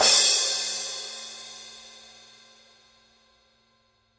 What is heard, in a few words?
14 fast crash edge; Forgées à partir d’un alliage secret de bronze; signature; paiste